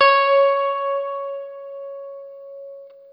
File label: Guitar & Bass; Quantum Leap; 90's Sample CDs